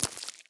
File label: spider; animal